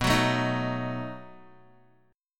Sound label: B 7th B down Guitar; B7 strummed; JGuitar